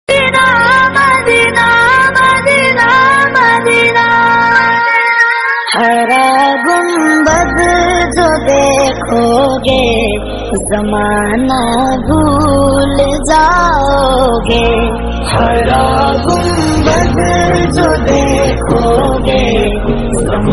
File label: ghazal islamic gazal